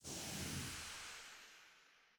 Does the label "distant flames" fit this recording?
Yes